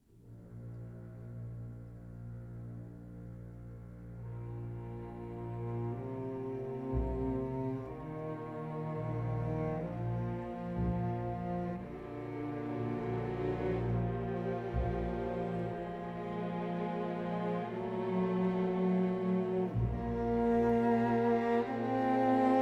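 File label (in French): madrigal d'été pour vc et orch; pour violoncelle et orchestre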